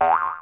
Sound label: boing